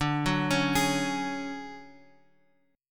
D Major Sharp 11th D Guitar Open F, chord; arpeggiated; JGuitar

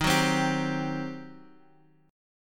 G Major 7th Suspended 4th Sharp 5th, Eb

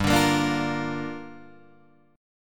G Minor 7th, Guitar, Drop D

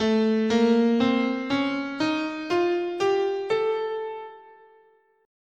A Altered

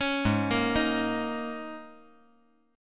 Ab Suspended 2nd Suspended 4th Ab down; arpeggiated; JGuitar

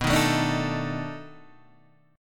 B 7th Sharp 9th, guitar; B7#9 Chord {7 6 7 7 4 5} strummed

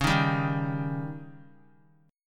C sharp Suspended 2nd Flat 5th C sharp; strummed; JGuitar

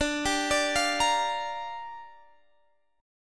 G Minor 7th, D

